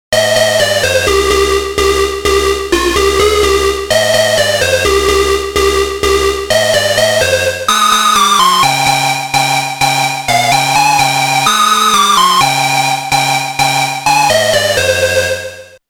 Shaky kobol lead

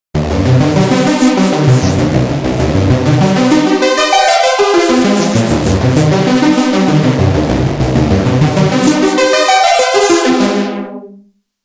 Knife

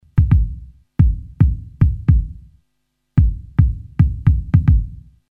Bass

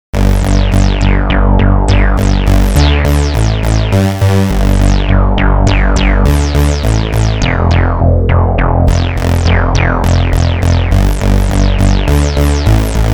Dark and reso

